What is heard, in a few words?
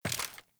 Steps